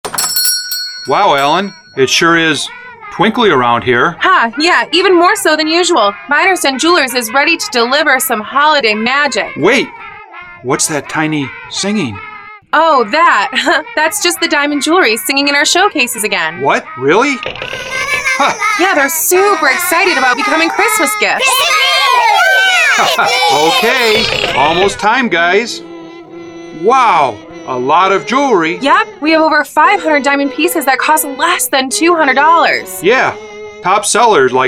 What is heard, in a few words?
Singing Xmas Gifts